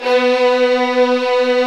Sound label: STR SW VLN, Vlns 8 fff, Agitato; Roland L-CD702